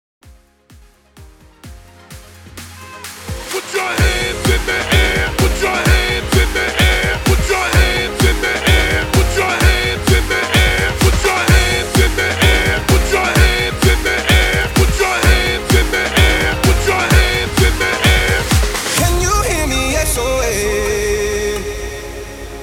BIG ROOM 128 BPM

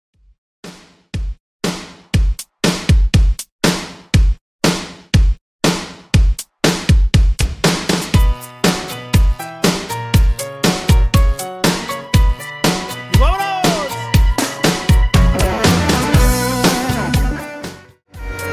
120 bpm

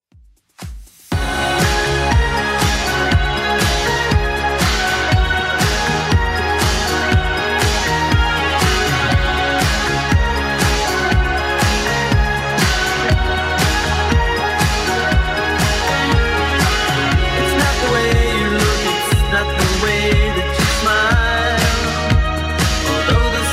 80s Club Edit 120bpm

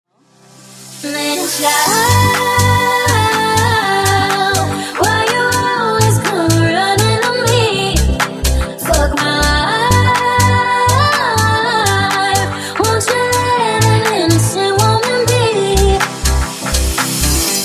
Disko Funk Remix 123bpm

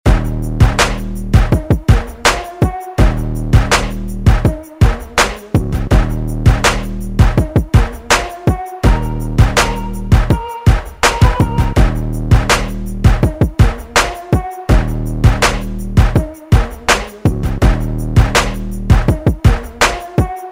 intro outro redrum

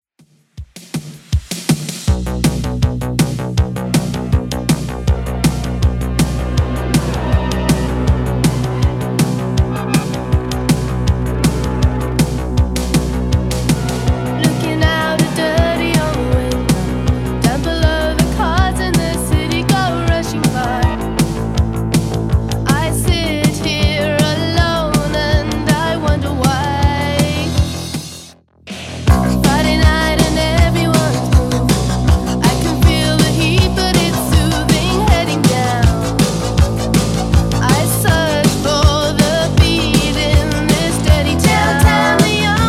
80s Rock Redrum 160bpm

